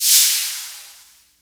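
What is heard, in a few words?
Brush swish, Roland MC-303 Percussion; Drum Samples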